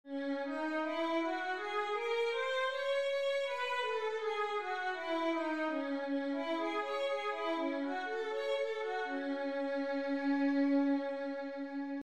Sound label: violin C sharp minor, scales 1 octave